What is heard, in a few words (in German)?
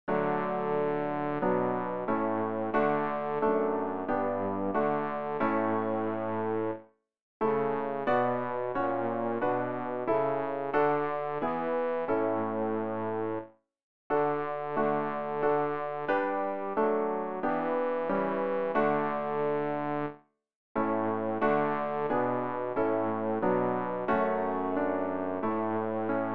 bass; Ref. Gesangbuch; RG 620: Ich bitt dich, Herr